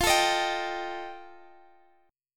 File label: F 9th F, chord; strummed